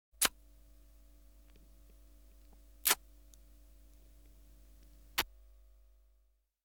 Woman Kiss; KMP Film Video Sound Effects

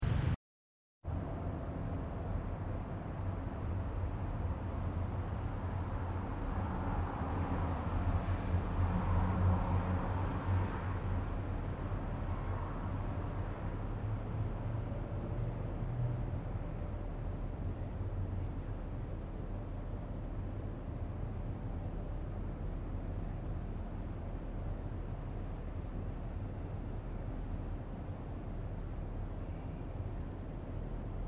German City Ambience; Sound Ideas; Series 2000

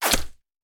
Bullet Impact; Sound Ideas; Guns

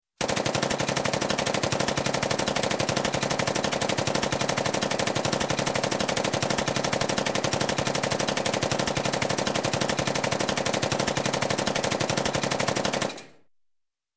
Gun, Machine Gun 45 Cal. Sub-Machine Gun; One Long Burst; Sound Ideas 2000 Series

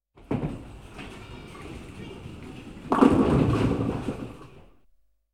Bowling Strike; KMP Film Video Sound Effects

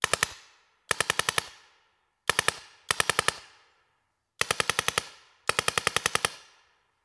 Paintball Gun; Sound Ideas; Guns

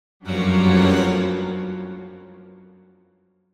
Symphonic Hit Strings Calls - Quick; Hollywood Edge; sound designer's toolkit v2

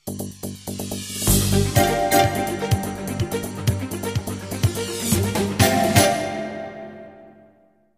Jingles; 90 DDD Jingles

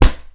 balloon pop; noisemakers; NS Studios - ultra sound collection

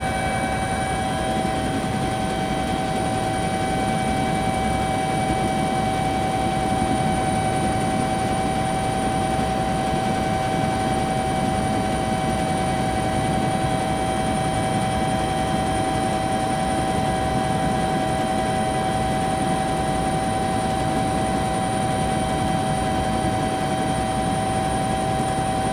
AMBISONIC INT Helicopter In Flight Bell Ranger; Vehicles

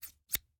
Scissors, snap, snip, shear, med large, single; Eiravaein Works; Vaeyan IV

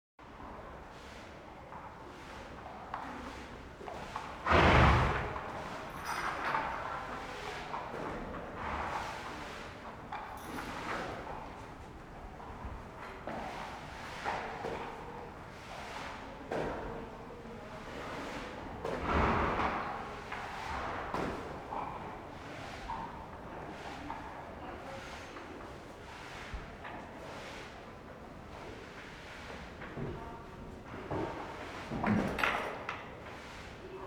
Animal effects snorts grunts farm ambience; Valentino Production Sound Effects Library CD50

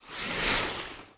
rain splash; Blind Drive

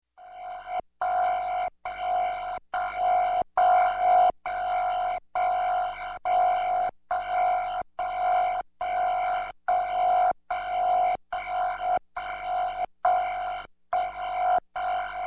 Space Warble Code, Electronic; Sound Ideas 2000 Series

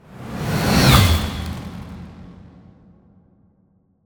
Ice Spell Whoosh, Cold Whooshes; Arctic Aura; Snowflake

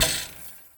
Street Ball Chain Rim, Street Basketball; SFX Kit

